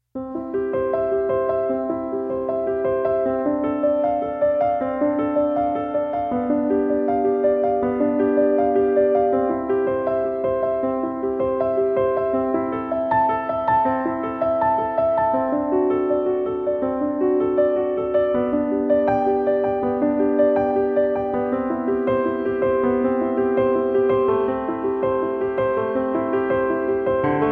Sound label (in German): klavier